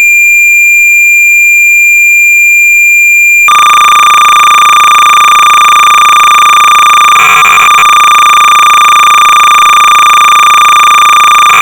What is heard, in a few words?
BASIC Sorcerer 300baud; Stomper